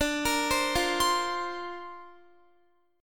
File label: D-Minor 7th Sharp 5th, D, Guitar, 4E, 4A, 5D, 5G, 5C, 6F; arpeggiated; x,17,20,17,17,19